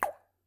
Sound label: plop, bubbles